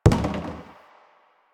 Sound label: Plastic Hit Short; Warframe; Ambience; Nineteen Ninety Nine